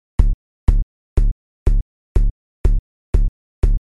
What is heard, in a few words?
house techno fat sub kick 122 bpm; drum loops